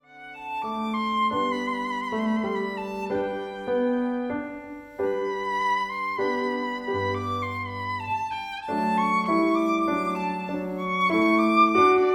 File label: VIOLIN